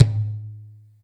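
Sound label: TOM soft; Zero-G; Chemical Beats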